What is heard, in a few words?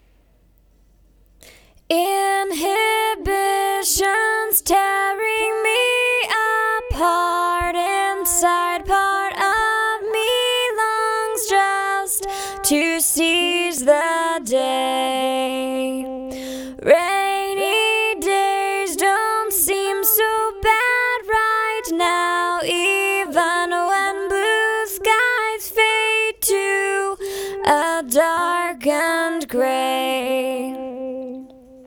chorus auto